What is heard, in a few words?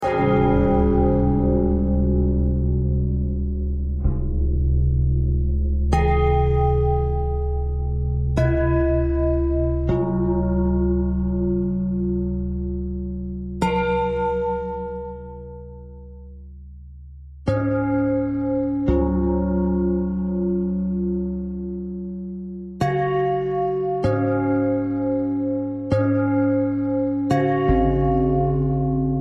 bell thread